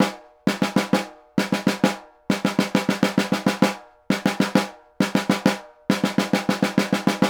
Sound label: snare, Roland; LA Composer